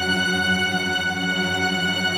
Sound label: Super Mega Win Loop